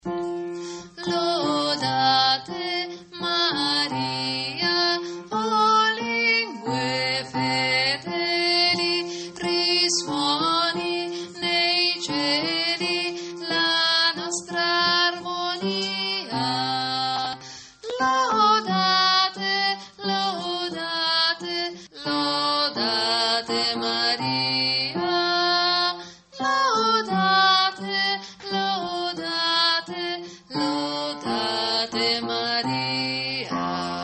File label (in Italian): lodate Maria bassi